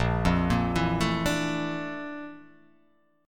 A sharp Major Sharp 11th

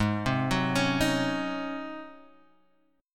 G sharp 11th G sharp, down, Guitar, Open F; arpeggiated; JGuitar

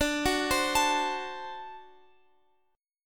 D 7th D Guitar Open F; arpeggiated; x,x,14,13,15,16; chord

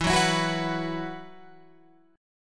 E Suspended 2nd, Guitar Open F; Esus2; strummed; 16,13,16,13,14,13

